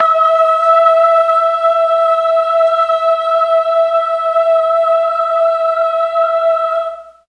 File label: E3 BOYS CHOIR, mellotron